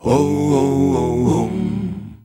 Male Voice, African Voices

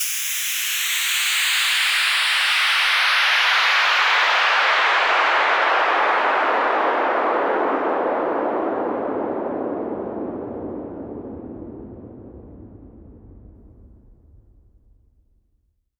Sweeps Down

